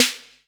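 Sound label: Kick Kit Snare; Novation LaunchPad Mini MK2; Ableton Live - RGB Demo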